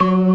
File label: CLASSIC C3, Synth and Organ